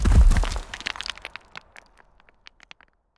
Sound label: Music Minigame Statue Fall, Player Instrument